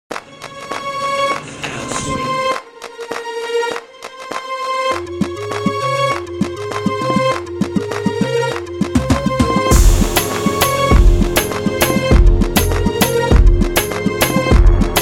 reggae clap

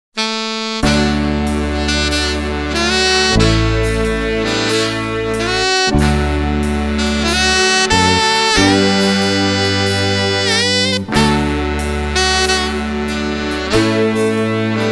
Auld Lang Syne; Soundzabound Volume 2

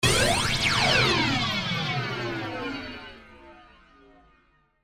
Sweeper Burst

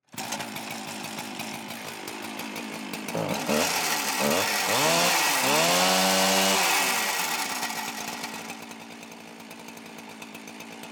chainsaw

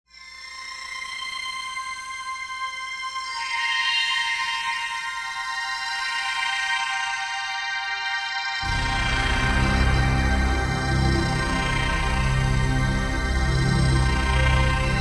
Sweeper Spacey

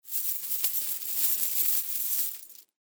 Chains

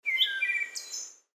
bird chirp

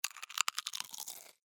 Chew Crunch

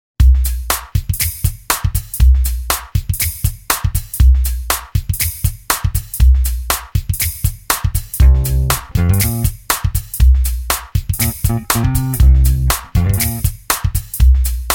Smooth Street; Soundzabound

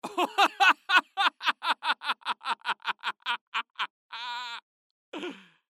Laugh

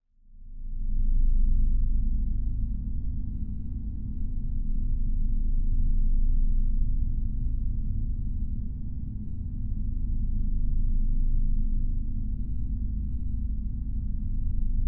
Drone Subtle

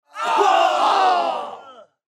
crowd ohh

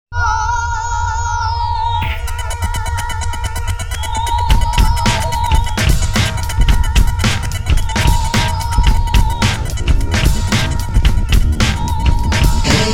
Urban Grind; Soundzabound

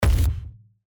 Impact

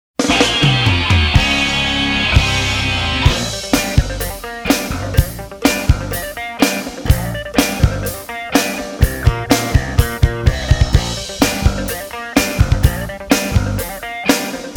Brass Tacks; Soundzabound Volume 4

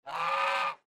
duck squeal

